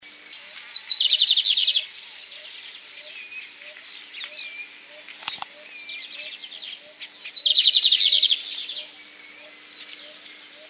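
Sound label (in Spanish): corbatita overo, Sporophila lineola, Lined seedeater; cantos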